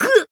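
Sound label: Voice damaged, Japanese; isis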